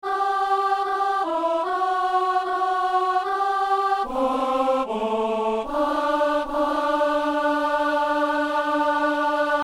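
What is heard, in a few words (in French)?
gloire et louange à toi seigneur jésus, alto; Saint-Denis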